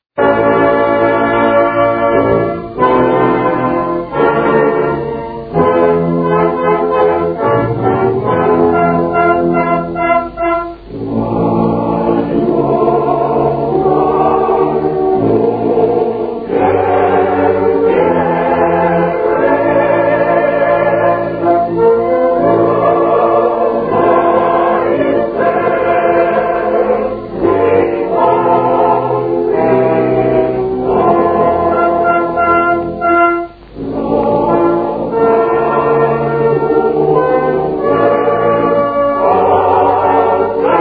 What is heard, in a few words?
choir, military music in Italy; FASCISM